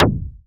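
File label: kick, Roland MKS-80 Drums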